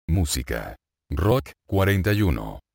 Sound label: rock